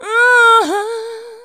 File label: VOX, Singin; Sound & Vision; Gigapack II CD 2 (Roland)